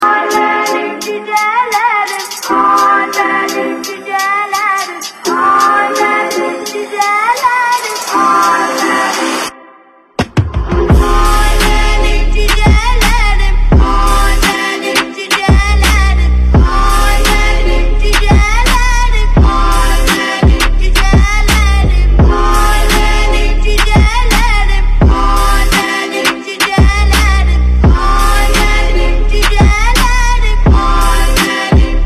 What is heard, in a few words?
Funk Ringtone